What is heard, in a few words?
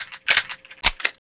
revolver cylinder open